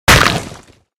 Pillar Break

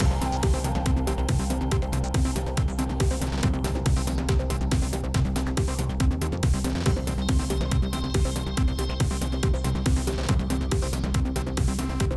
Alert State 8CH

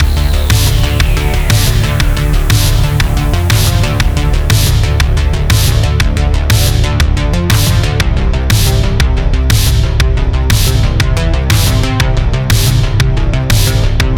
Break In - Loop Full; Endocrisis; Mercurius Fm

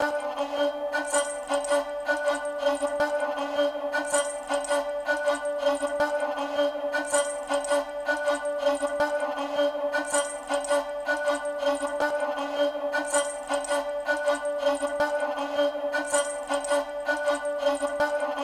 Sarangi Harmony; raji an ancient epic

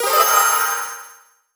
Levelup; the king of fighters for girls; android

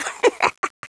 Sound giggle

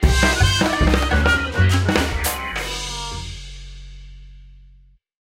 Level Failed; Bad Piggies 2

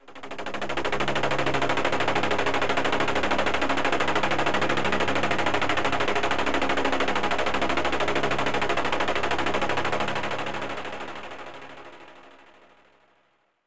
Overlay Dark

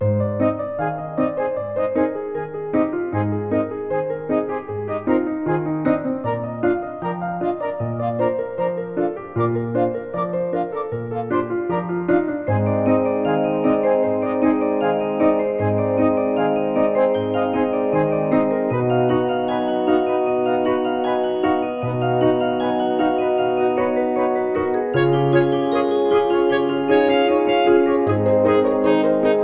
Elevator Minigame; peppa pig world adventures

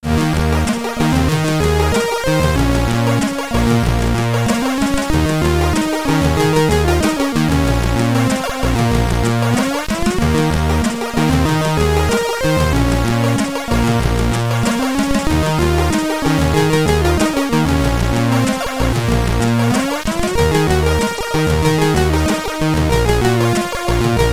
High Score (SID Stereo); Illgen; Commodore 64

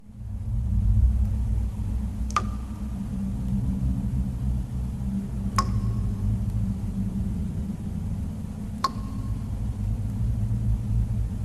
Cavern Ambience; Five Nights at Wario's 1

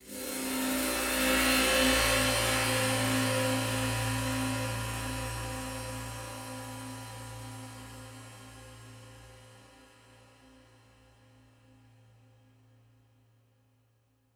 Gong

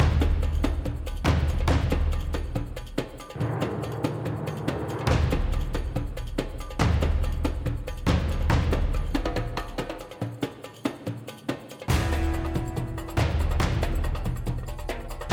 Perc loop

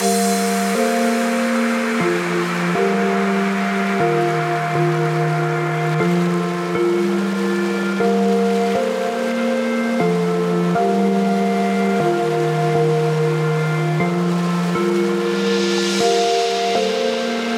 Tropical Ocean; Tropical Vacation

